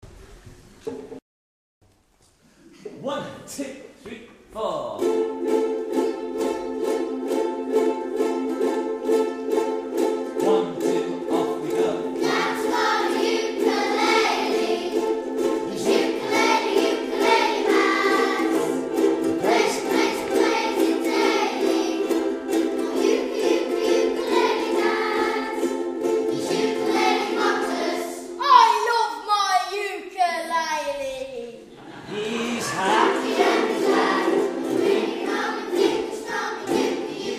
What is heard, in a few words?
Uke